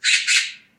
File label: magpie; animals